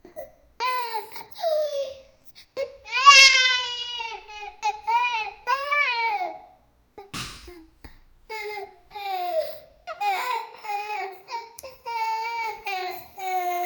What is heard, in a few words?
child crying age 6 to, indoors